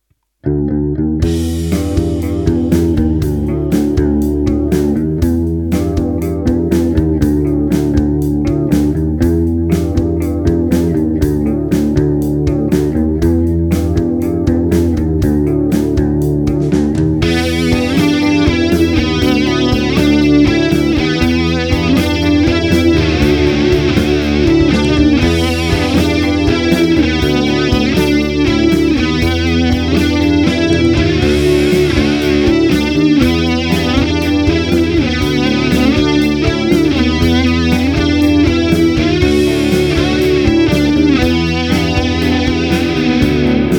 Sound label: Chorus